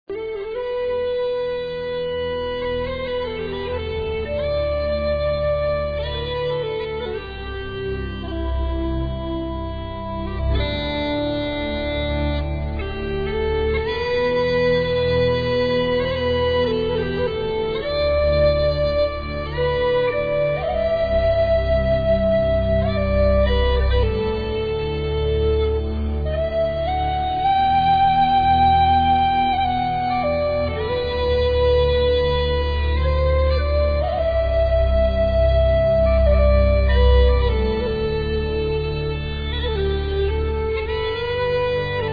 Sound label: celtic bagpipes